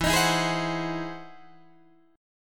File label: E Augmented Major 9th, Guitar, Open D